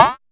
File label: hats, drum machines, FutureRetro FR777